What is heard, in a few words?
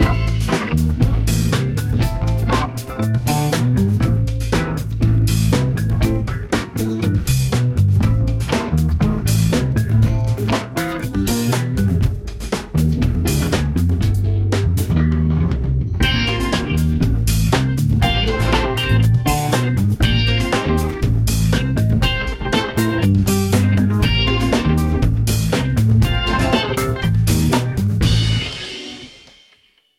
funk 1970s acoustic drums motown electric guitar 120 bpm groovy bass jazzy rhytmic